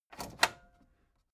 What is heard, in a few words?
tape deck open